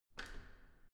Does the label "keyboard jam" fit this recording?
No